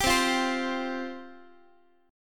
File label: C Suspended 4th C, Guitar; Csus4 strummed; x,x,10,10,9,11